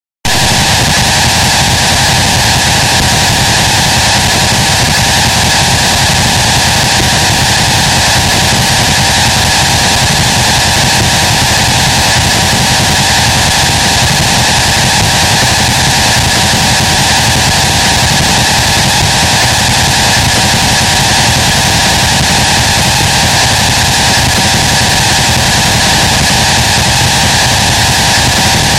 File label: Morning Noise Broadcast